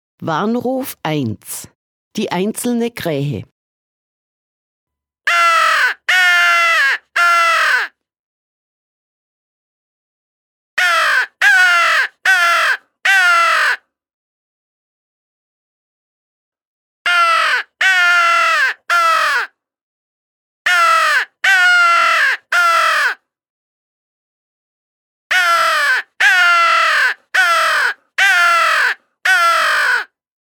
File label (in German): warnruf die einzelne kraehe